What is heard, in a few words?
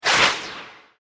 Laser Flare Gun Shot, laser gun, science fiction sound effect